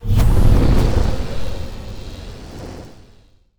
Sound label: necroid ship engine; Stellaris